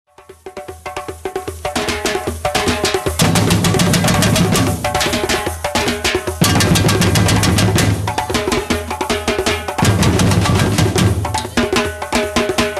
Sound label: batucada